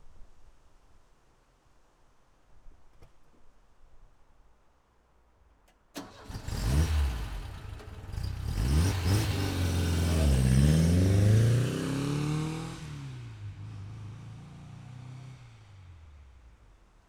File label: saab v4